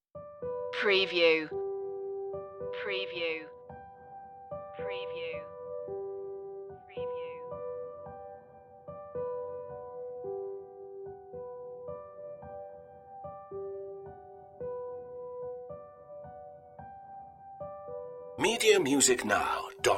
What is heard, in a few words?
the softest steps no clarinet